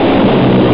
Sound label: Zoom; DVideoIII-Demo1